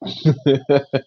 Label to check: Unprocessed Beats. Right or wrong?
Wrong